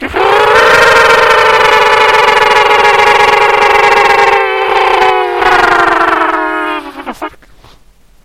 alien mating call

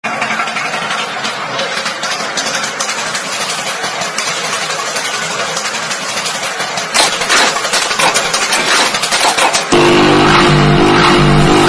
factory beat

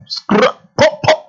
skrrrrrr pop pop

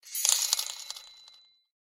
magic coins

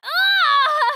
impaled scream

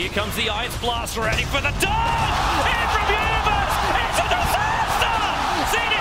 echo slam; universe; slam dank; dota 2 ti5

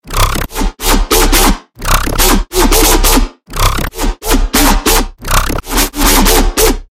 dogstep

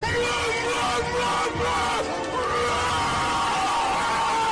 moo scream; tyler1

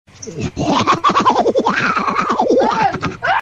goooofy laughhhhhh

